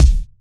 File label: prominent bass drum c key